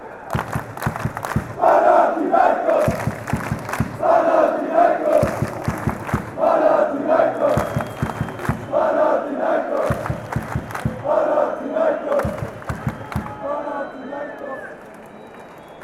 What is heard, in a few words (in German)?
klatscht rapid, fanchants